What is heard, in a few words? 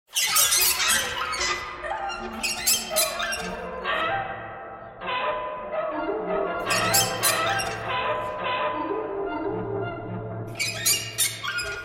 birds buzz